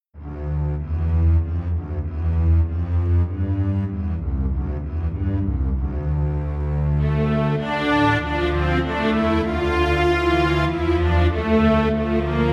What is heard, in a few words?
Minuet in D minor; From Tara's Midi Files